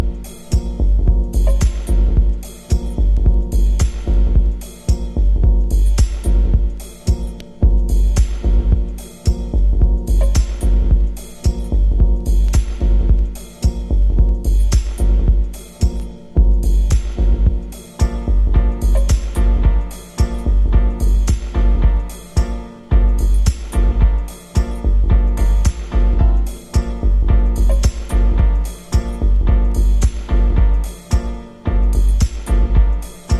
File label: DOWNBEAT